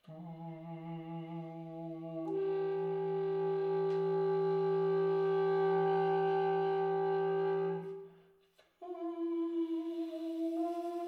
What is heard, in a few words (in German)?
sfx alt, saxophon spieltechniken; Gesungener, dazu gespielter Ton; kurzes Beispiel zur »Zweistimmigkeit«.